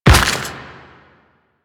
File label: Step Dirt, Footsteps; Warframe; Quests; The New War; Kahl